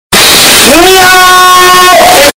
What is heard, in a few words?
miauuuuu